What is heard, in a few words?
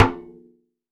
Surdo Rim, Percussion; Roland BK-5